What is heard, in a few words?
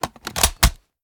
reload empty magin; reloads; iw8; uzulu; gmod